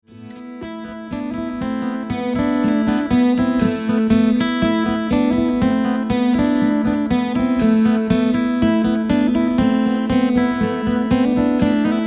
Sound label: Riu Riu Chiu, solo guitar; early carols for solo guitar